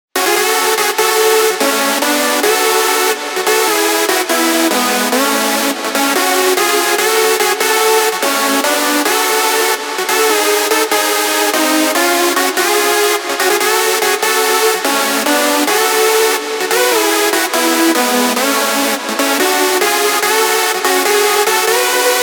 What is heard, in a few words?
Modern Lead, Modern Hands Up and Hardstyle leads; powerful, wide, and cutting through the mix; CESA SAMPLES; DJ THT Modern Lead 1